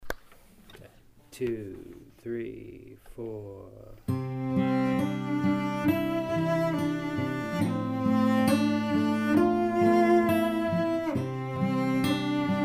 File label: Cello Guitar